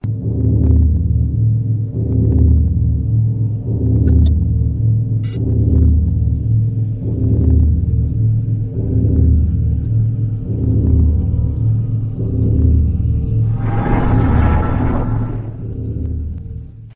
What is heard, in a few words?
suspense hi tech